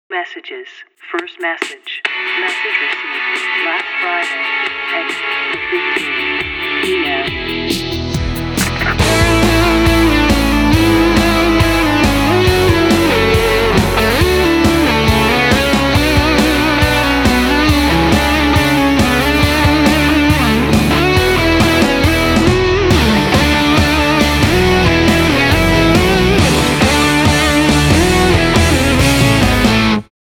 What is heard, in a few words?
guitar spoken